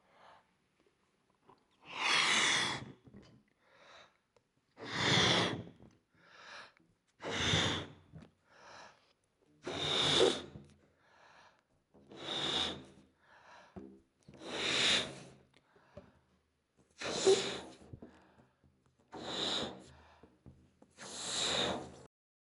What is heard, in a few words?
Blowing A Balloon